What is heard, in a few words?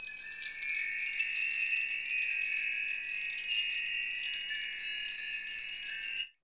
XTRA PERC